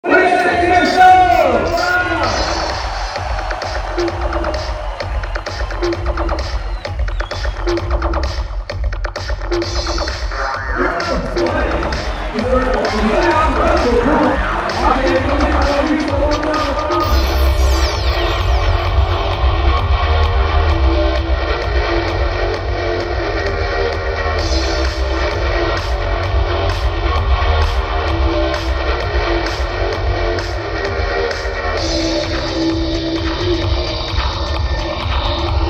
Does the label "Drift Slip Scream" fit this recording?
Yes